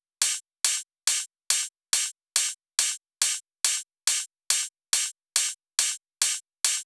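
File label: drum Loop 140BPM overhead; Vengeance - Essential Clubsounds Vol.5; VEC5 Drumloops; VEC5 Multi Loops 140BPM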